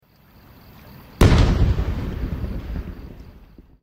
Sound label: Large Fireworks